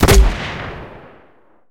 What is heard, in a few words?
grenade launcher